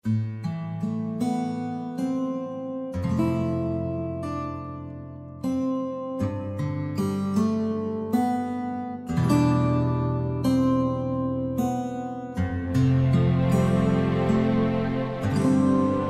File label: plants instrumental song